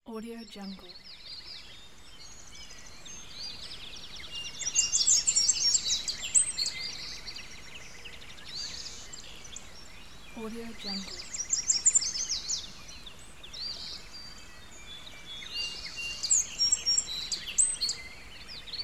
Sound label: forest